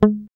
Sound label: GUI MUTE, E-GUI MUTED; Sound & Vision; Gigapack I CD 2